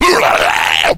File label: attack, slender shiver